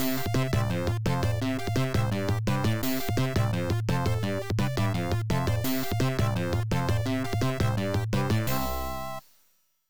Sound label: Tricky Rating Jingle; lemmings 3d pc